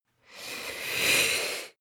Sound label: female breath slow in